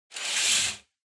Curtain

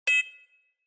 Cyber

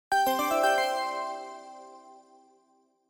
Chime Announce

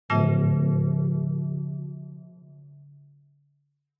Onoma Negative Long

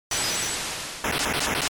SNES RPG Magic Ice

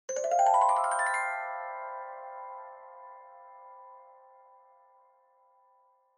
Music Box Reverb Long

